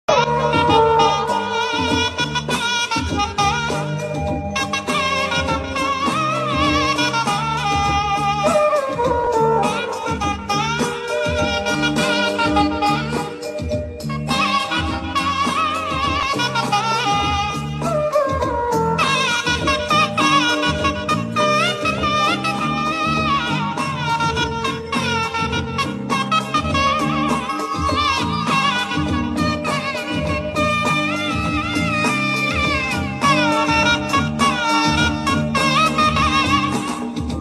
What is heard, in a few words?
nadaswaram bgm ringtone